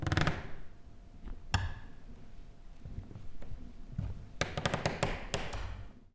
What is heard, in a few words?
Creakings